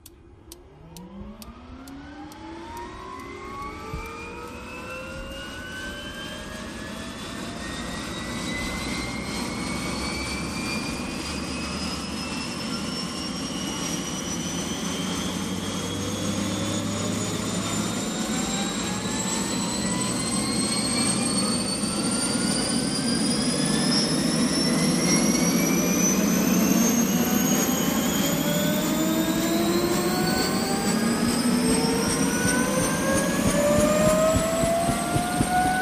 UH-1 Huey: Interior Start-up, Power-up To Idle. Split Image R-channel Main Prop, L-channel Tail Rotor. Good Prop Definition